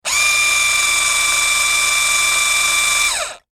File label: Industry Electric Drill Tighten Screw Whine Stress Long; Drills